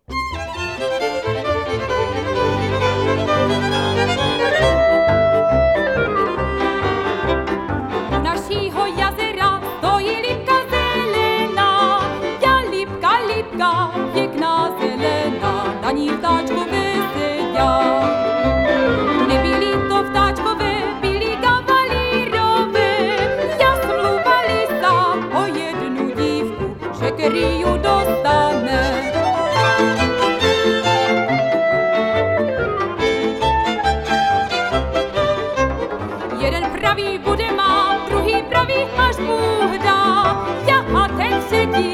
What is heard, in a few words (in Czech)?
folklor